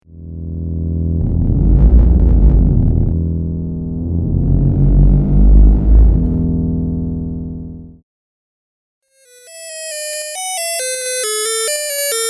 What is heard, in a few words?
micromoog